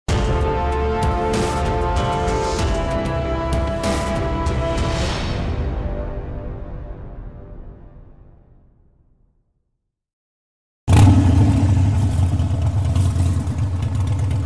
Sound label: HARLEY DAVIDSON MOTORCYCLE